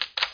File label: reload silenced; SpecOps2MPlayerDemo